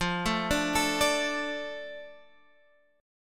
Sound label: F 6th F